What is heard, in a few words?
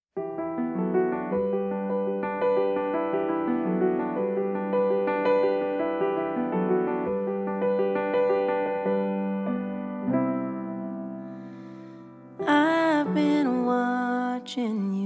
Recorded Live